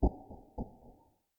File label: footsteps; Five Nights at Treasure Island 2020 Remake; windows